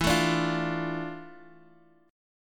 D Minor E, chord; Dm/E strummed; JGuitar

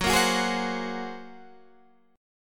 Bb 7th Suspended 2nd Sharp 5th Gb

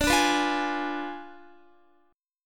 D Diminished 7th D down Guitar; Ddim7 strummed

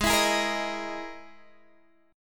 E Major 7th Flat 5th chord

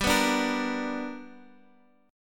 B Minor Major 7th Sharp 5th

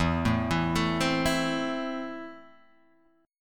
A Minor E

